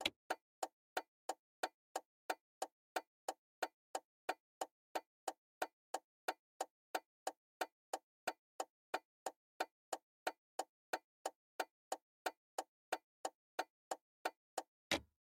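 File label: car turn signal sounds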